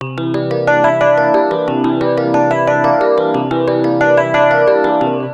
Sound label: E-Piano Arp, electro-piano